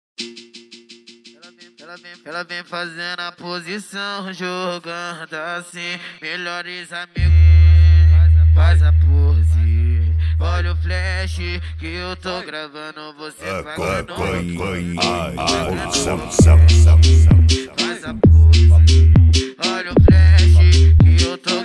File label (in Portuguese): funk automotivo